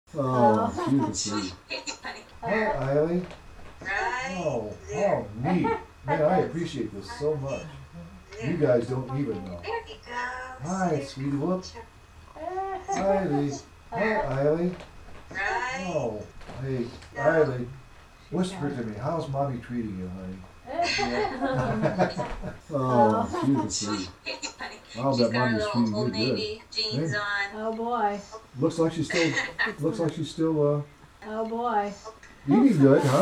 Picture Phone

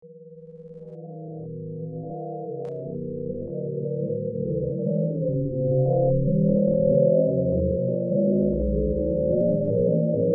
Simple Sine

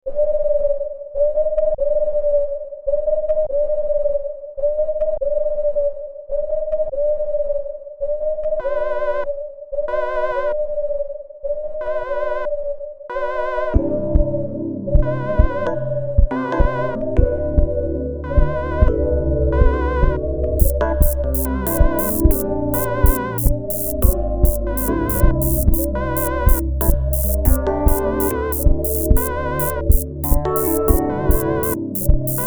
Haunted Loops

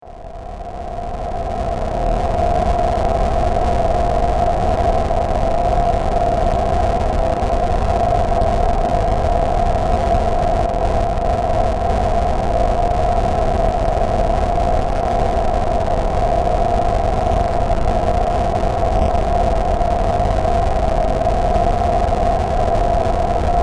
Amen Buzz

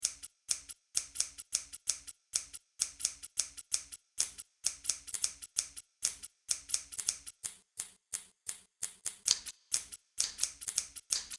Cutting

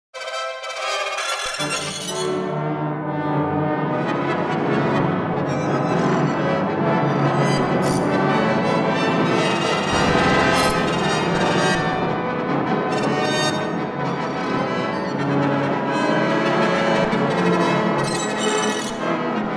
Brass

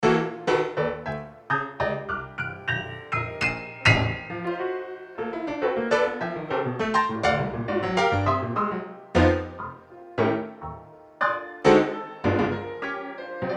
Rhythm

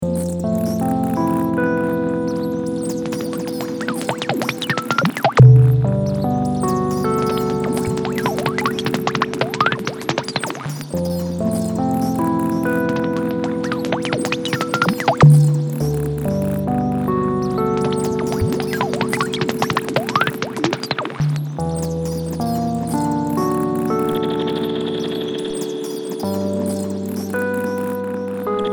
Sonar

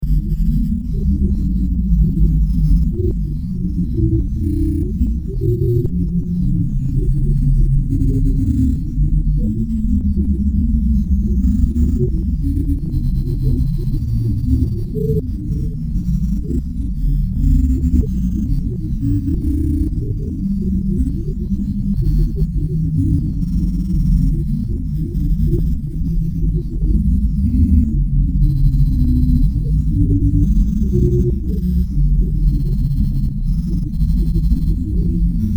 Boiling

